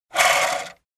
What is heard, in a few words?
Spinning Plastic Wheel